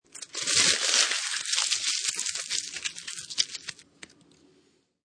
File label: Metal Foil Aluminium Foil Crunch; Crunch Sound Effects; Objects